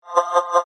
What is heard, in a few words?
Scanner Beam By, Scifi; Science, Fiction; Tech Sound Effects; SoundEffects-BlastWave